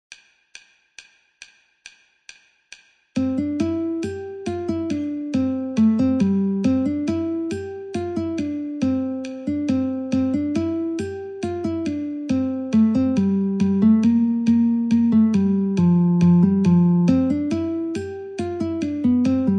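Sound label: C Guitar metronome 69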